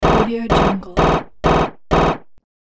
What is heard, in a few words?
repeating element for games